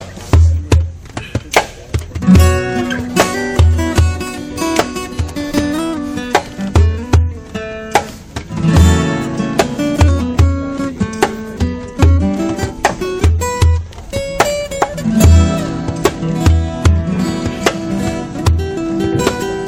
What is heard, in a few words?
srilankan guitar; ringtone